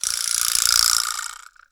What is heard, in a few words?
VIBRASLAP; PlatinumPhattCD2; E-MU Producer Series Vol. 8 – Platinum Phatt; Flexis Etc